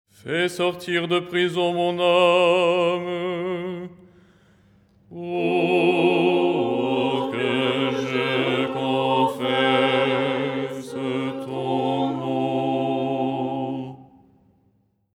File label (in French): basse; Verset : "fais sortir de prison mon âme..."; Les 8 tons en français; ton 4